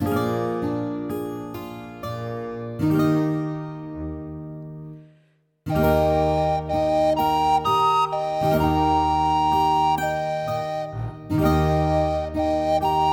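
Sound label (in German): Jodler, Flötentöne; Jodler I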